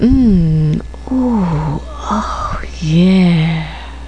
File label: OOOOH AHHHHH; Sexy Sounds